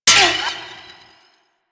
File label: parry bullet; Warframe